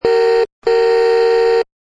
Two extended toy honks in a row; Toy 2 Honks; Toy Cars; Toys

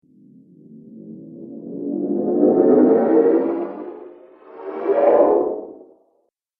Scary Pair Zoom, whoosh; Zoom 2

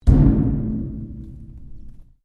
Strike Metal Hollow Tank; Metal Sound Effects; Materials

